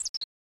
Birds

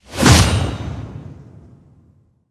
Hero Brute force; Veruk

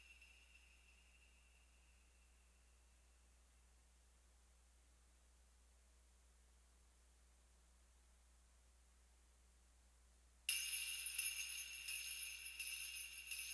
Christmas Ambience Jingle Bells; hot lava; ios macos windows

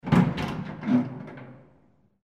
Hinged closing

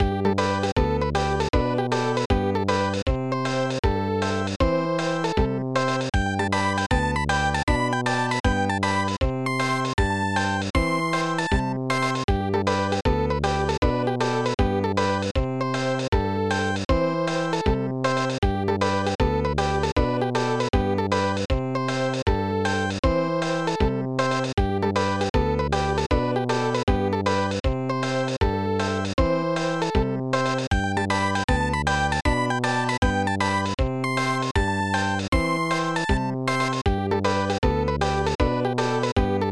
Round Music Faster; pipe dream snes

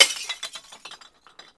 bottle break

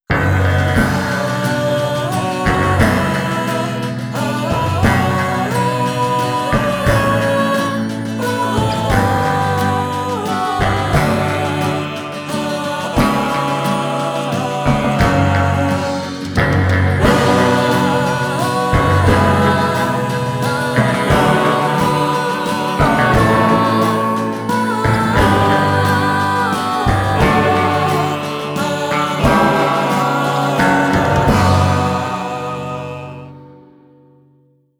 Victory Song; Metal Gear Solid 4 Guns of the Patriots

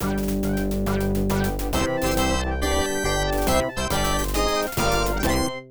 All Stage Clear; tokimeki memorial forever with you; ibm pc at

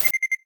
Tesla Mine Bleep; City

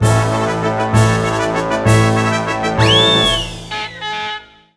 Race Win Jingle; Nicktoons Racing

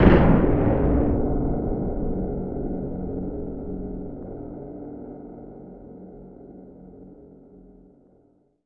Cannon Travel Launch; Secret of Mana; snes

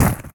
ice crunch; ice age scrats nutty adventure

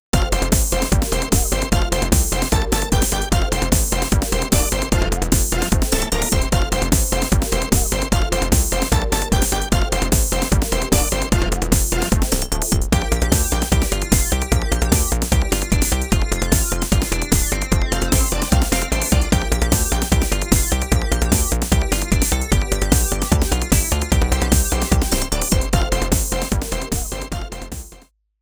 Techno; Thousand Arms

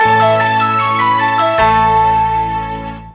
SFX Success; Futari wa Precure Splash Star Panpaka Game de Zekkouchou

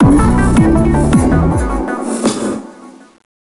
Country Crash

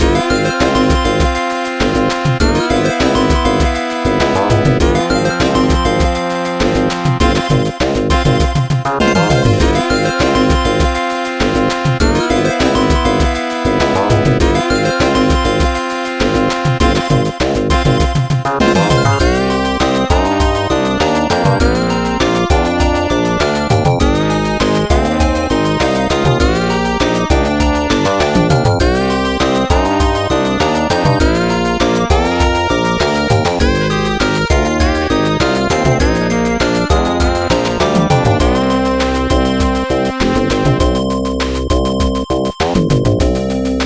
Dungeon; Metro Quester; PS4 PS5 Switch Xbox One Xbox Series XS gamerip 2023

